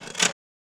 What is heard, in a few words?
Click Unlock; FREE SFX PACK VOL 2